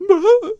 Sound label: Healer Attack Vocals